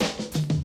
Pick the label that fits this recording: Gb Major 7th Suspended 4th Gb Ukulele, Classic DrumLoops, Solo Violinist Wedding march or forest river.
Classic DrumLoops